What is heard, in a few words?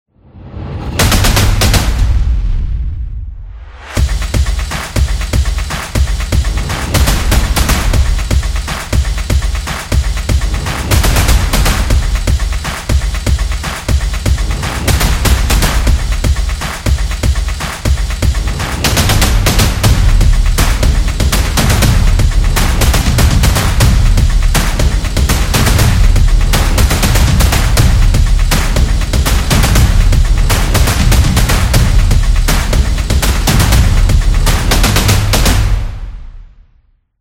Sports Drum Beat; action sport